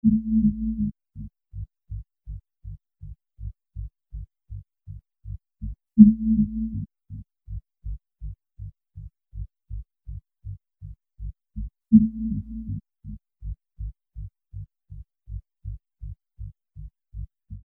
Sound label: pulse